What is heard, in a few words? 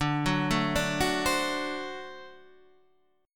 D 7th